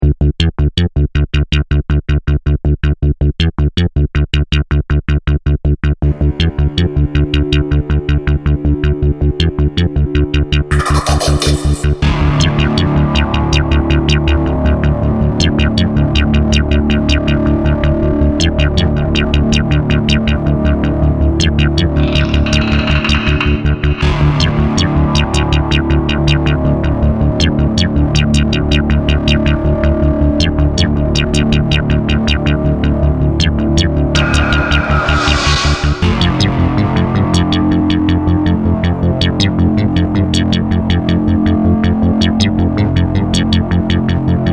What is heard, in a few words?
ambient